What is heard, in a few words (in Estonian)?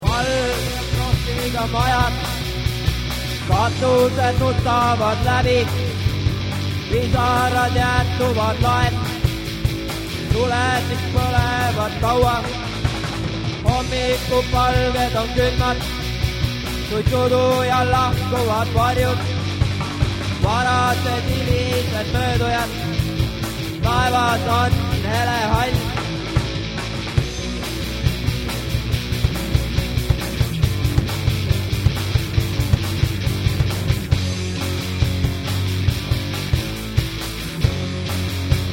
ajastu alevi polka